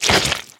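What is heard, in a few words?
zombie body impact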